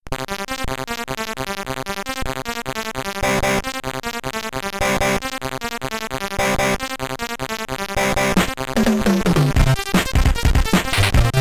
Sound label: Main Menu, SID Stereo